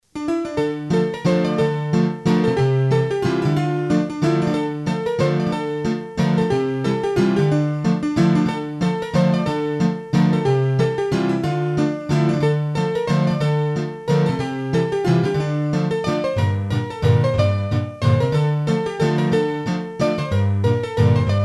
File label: Mazurka, hurdy-gurdy; Mazurkas